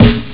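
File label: snare drum; twintris; ST-XX Amiga Soundtracker Sample Packs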